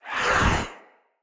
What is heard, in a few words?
Staff Caster Cast Long; Weapons; Player; Staves; Wazzard Staff Caster